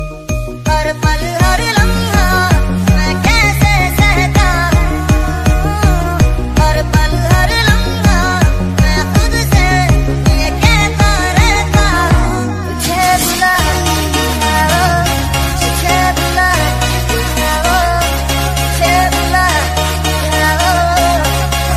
bollywood ringtone

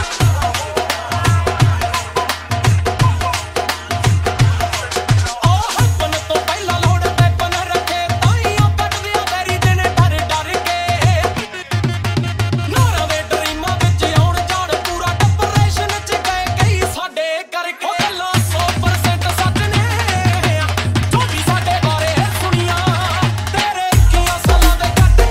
remix ringtone

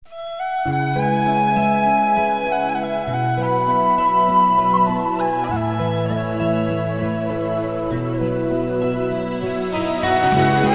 english movie romantic music tone ringtone; english ringtones

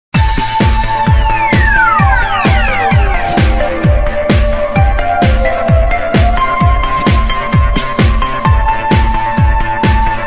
samsung theme remix ringtone

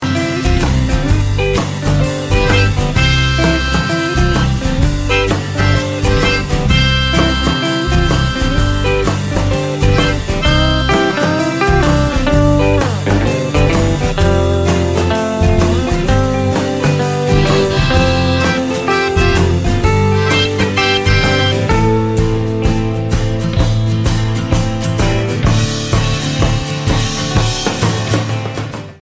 christmas birthday ringtone

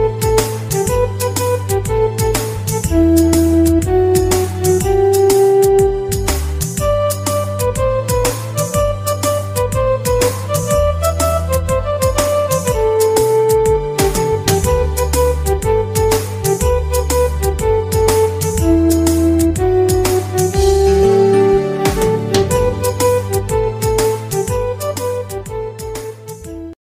bollywood music ringtone

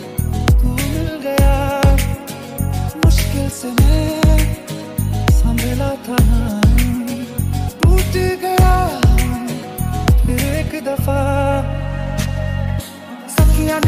mix hindi song ringtone

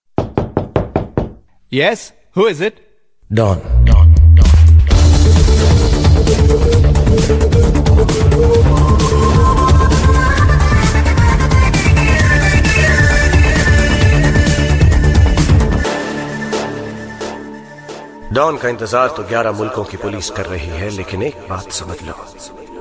telugu ringtone

